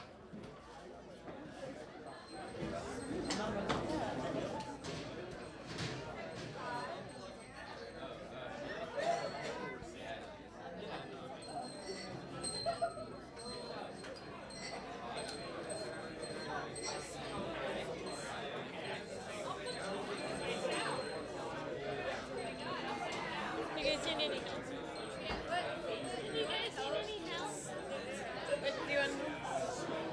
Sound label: chatter